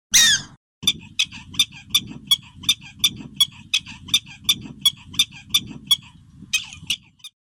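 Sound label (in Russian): луговая собачка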